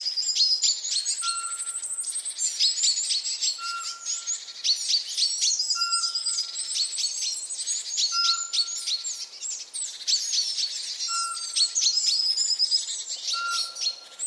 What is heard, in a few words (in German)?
sperlingskauz